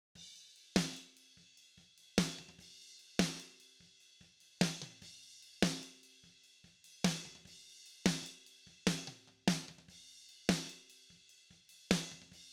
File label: snare